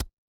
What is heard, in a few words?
ui pop